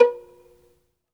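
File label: solo violin, strings; ILIO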